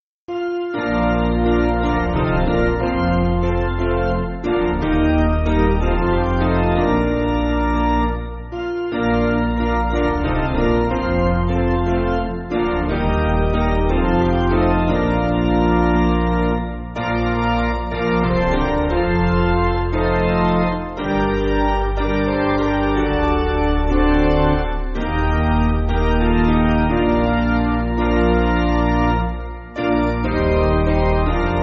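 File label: Piano, church backing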